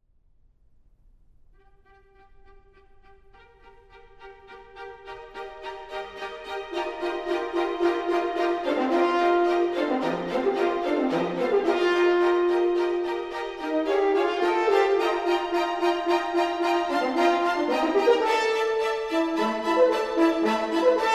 Concerto For Horn and Strings - Mvt. 1. Allegro moderato